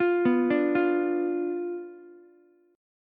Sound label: B Diminished B down Ukulele; Bdim arpeggiated